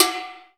SI SPIRAL, ETHNIC PER; AKAI S-Series CD-ROM Sound Library VOL-5

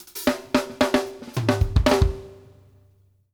off hat, drum fills

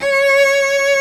C#4 CELLO; SOLO; Instruments & Percussions; InVision Interactive; Lightware VOL-1

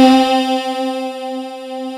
PLUCK, SY22 YAM; East West; Global Sounds

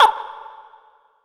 Vox Hit C3; FX; Club Techno

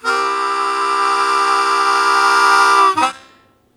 Blues Chord Attack C1, Harmonica; Zero G - Harmonica

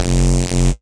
Dance Mega Synth; Best Service; 90 s Sample CDs

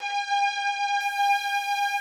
KURZ A5; Greytsounds

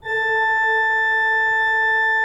A3, Cathedral Organ; Propeller Island

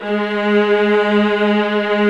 ARCO VIOLAS; OMI - Universe of Sounds

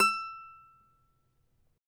CAVAQUINHO E, Guitars

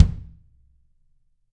GRCH 18X2 L, Drums, TUCSON KIT; Big Fish Audio; Ross Garfield Drums VOL-2

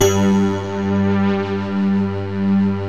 PAD G2, Emu Classic Synths; E-MU Formula 4000 Series Vol. 3; Analog Odyssey

